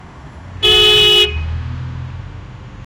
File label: a car honked at a, bicycle